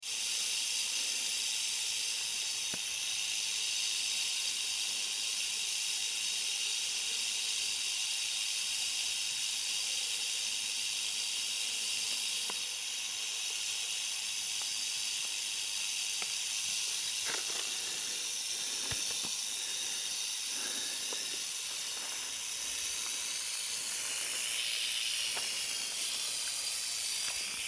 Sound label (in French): serpent